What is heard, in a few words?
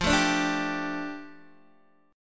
F 6th Add 9th, Guitar Open D